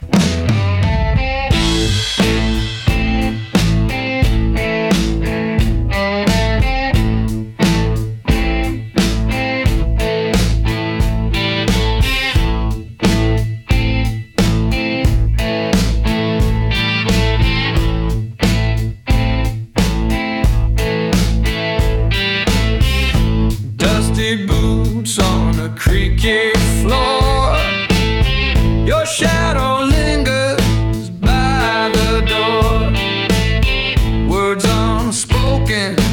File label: Train Station Blues